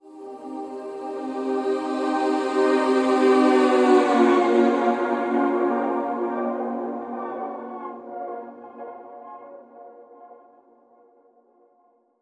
Wondrous Ethereal Bend

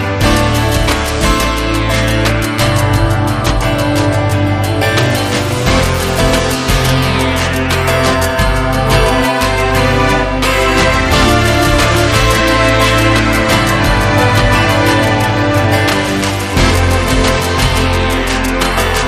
Synth Chorus

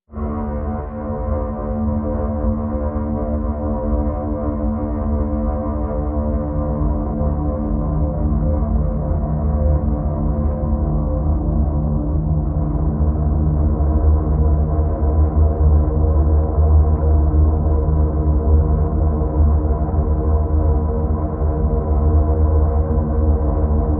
Basic Mix

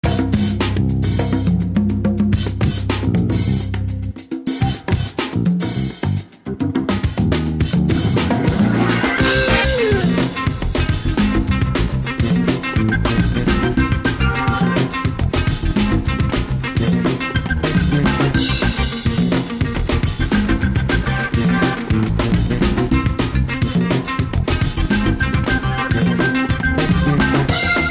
Freewheeling Funk

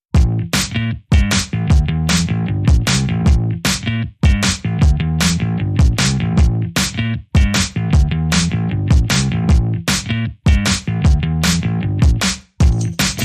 Drums And Bass Mix

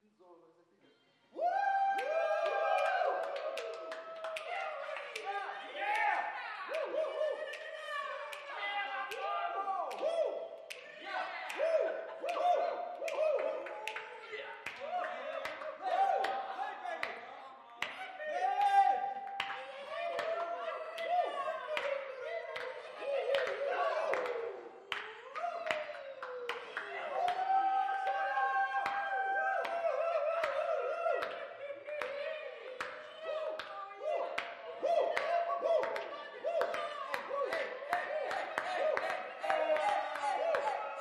Jazz Club Clean

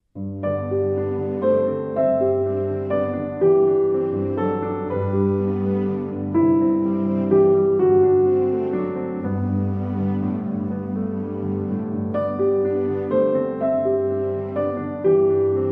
With Strings

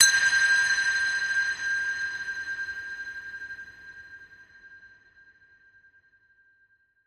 Delicate Church Chime

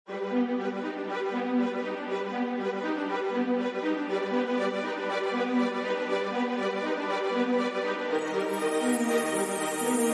Strings & Ambience

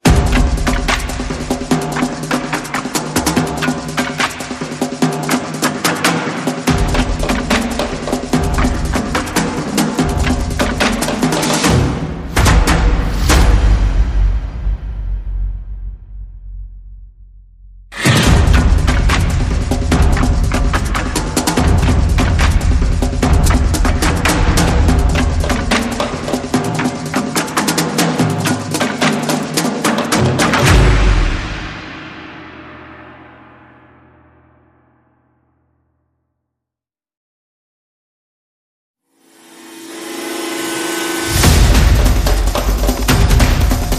Percussion

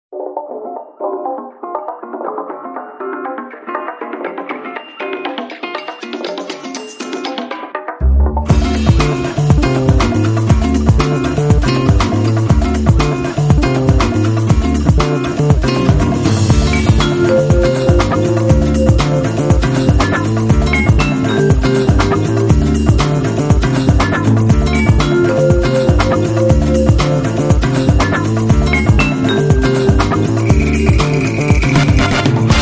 Bossa Inst

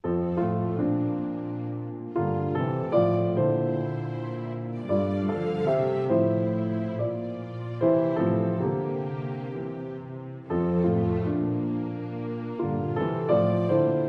With Strings

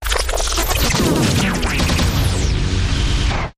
Big Chaos

